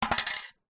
license plate down on road

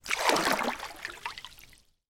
water splash or movement

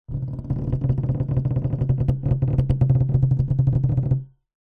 foley plastic hollow vibrate rattle

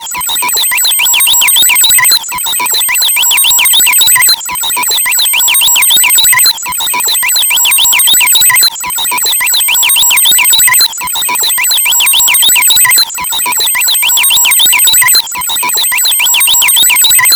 cartoon satellite signal receiver

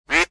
bird duck quack

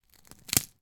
food breadstick snap; sound effects one

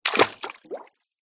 footstep feet jump into one foot water; foley

